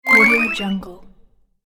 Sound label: boost restore replenish repeat; sonic-boom